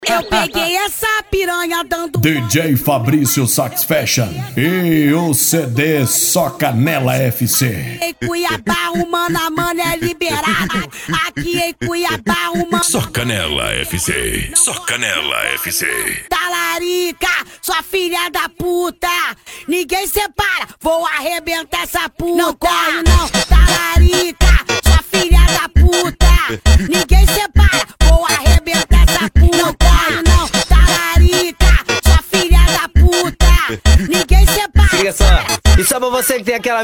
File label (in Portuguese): funk e lambadao